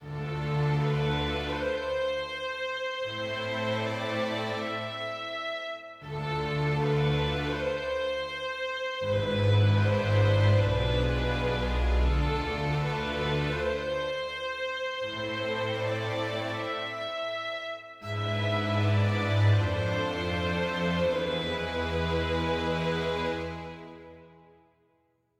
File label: sad forte